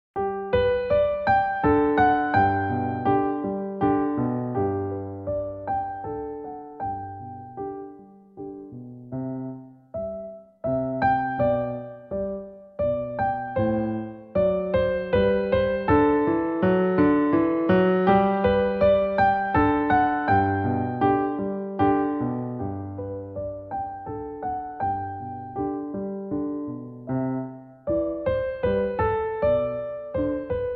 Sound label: Bach Minuet G Arp Slow